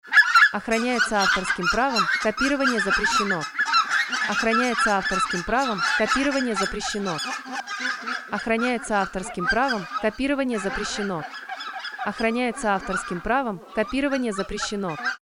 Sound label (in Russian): белолоб активное стадо, запись двух разных «семей»